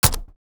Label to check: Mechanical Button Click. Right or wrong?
Right